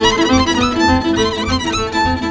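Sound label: VIOLIN GROOVE; Best Service; World Colours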